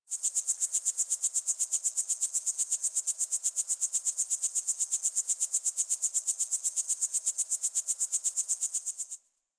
Tree Critters; Warframe; Ambience; Eidolon Plains; One Offs

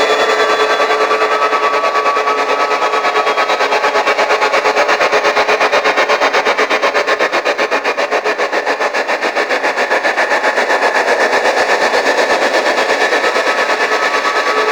Archwing Healer Engine Loop Slow, Vehicles; Warframe

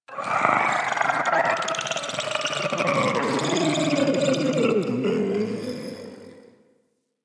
Alchemy Lab Create Vocals; Warframe; Hub; Liset Alchemy Lab

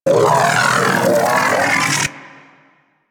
Arch Gun Alt Charge Full, Weapons; Warframe; Archwing; Hildryn

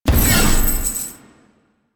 Shared Fate Chain Stop; Warframe; Warframes; Pax Duviricus

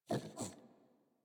Puppy Idle Snort; Warframe; Kubrow

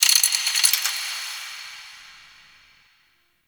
Fortress Chains Small; Warframe; Grineer Fortress

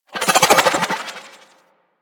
Fan Energy Trench Projectile By; Warframe; Warframes; Protea

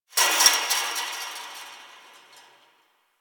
Fence Rattle One Off; Warframe; Ambience; Nineteen Ninety Nine; DE

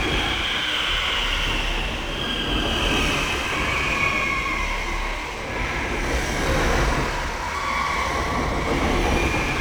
Void Connector Door Loop, Ambience; Warframe; Citrines Last Wish

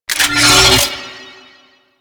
Archwing Sword Equip; Warframe; Weapons

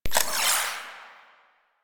camera off; Warframe; Veilbreaker; Quests; Kahl

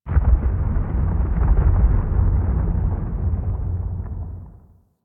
Landscape Glacier Ice Distant, Ambience; Warframe; Venus Open World; One Offs

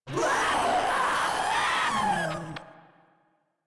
Proto Infested Runner Vocal Attack; Enemies; Vocals; Warframe

